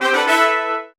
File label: multimedia point awarded fanfare; dustyroom